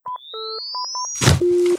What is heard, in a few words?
shock; gmod; masita explosive expansion workshop content